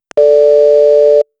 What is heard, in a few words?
ai notification